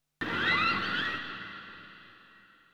AMBNT 160; Ambient

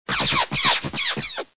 explo

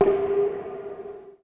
industry hit c4; PC Gamer IT CD 47; Centauri; alien-x-fire-demo

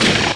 Enemy Fire; PC Gamer (Italian) 32; SWARM

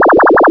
WARBLE

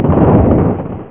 EXPLO; Flashback Demo

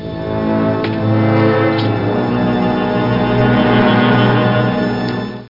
climbing music; Super Games Pak (1991)(Odyssey)

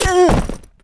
death female, voices

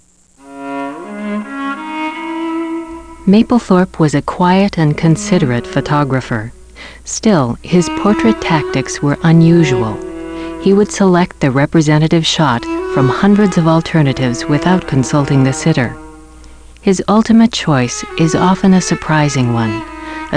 celeb; Robert Mapplethorpe - An Overview; music

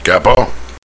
Hitman Click

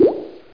DRIP; dosgames; roc

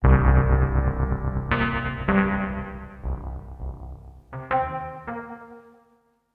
SYNTH D+B 160 C; eJay; Keys

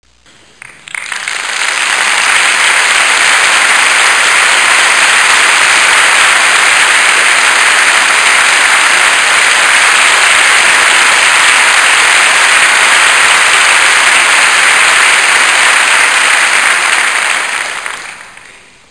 Applause in a Concert; Human; Soundsation

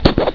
CROSSBOW

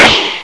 Guided Fire, Guided Missile; Casady & Greene; Crystal Crazy Demo

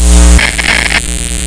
zap; Foul Play™ Demo

